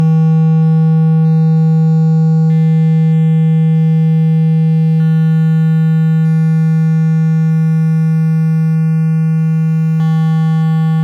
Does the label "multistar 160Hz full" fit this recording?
Yes